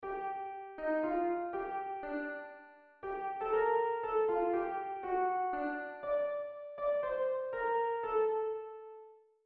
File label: John Come Kiss Me Now piano